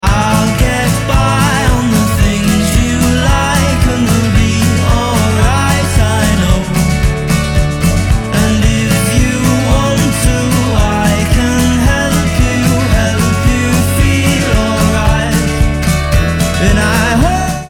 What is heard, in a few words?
Extra Bass In